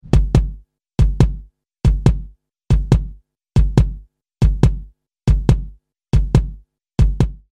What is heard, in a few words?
Chorus Kick Added Layers